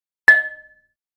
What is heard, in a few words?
Free Weights Exerci